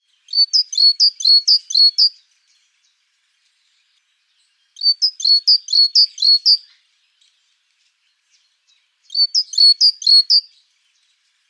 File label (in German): kohlmeise